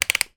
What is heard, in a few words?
pedestool fan head adjust clicks; household